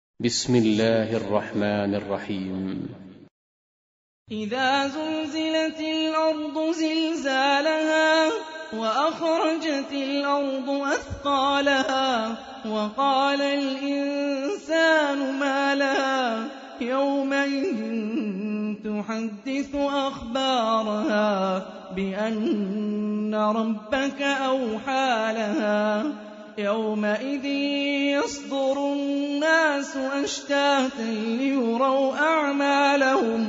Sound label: Quran; Hani Refaey